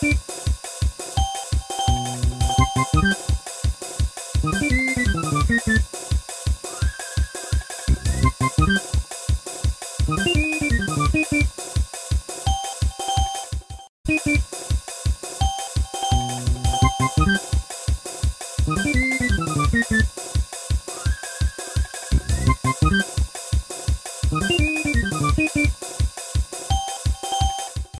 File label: nokia speed up